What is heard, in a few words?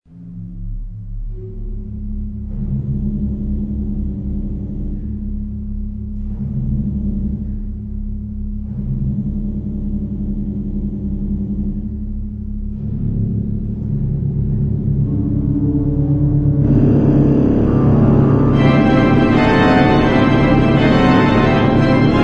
Bagpipe